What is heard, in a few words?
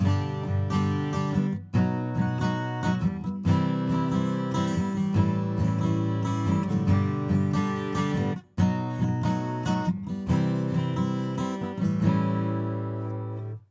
Acoustic